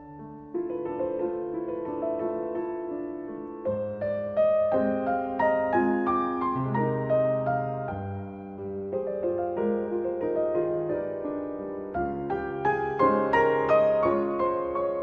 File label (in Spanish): musica clasica ringtone